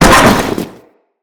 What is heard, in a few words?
Fall Metal, Foley; Characters; Tenno